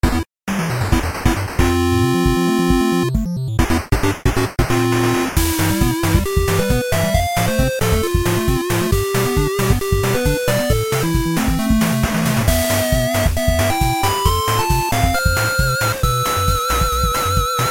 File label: Bgm Boss; Soccerdie: Cosmic Cup